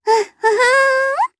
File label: Hum, jp; Rodina; kingsraid; voices heroes